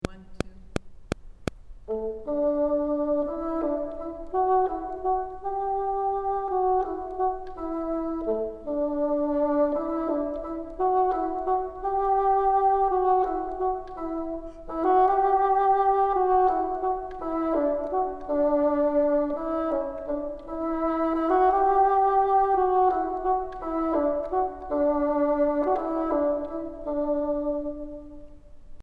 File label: top, bassoon; Twinkle, Twinkle Little Star